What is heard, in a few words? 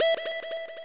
sub lead e4